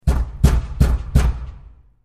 Heavy Knocks On Metal And Glass Door With Rattle And Reverb; knocking